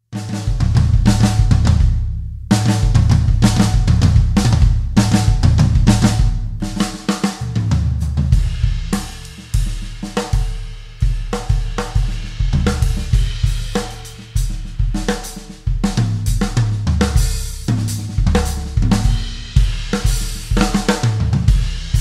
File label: big band groove